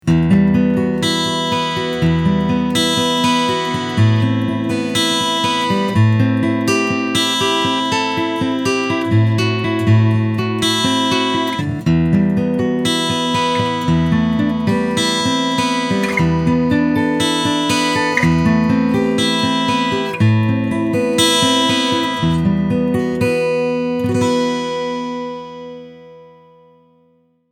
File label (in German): Lava ME2 Pur